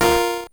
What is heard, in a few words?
multiplier F5 A#5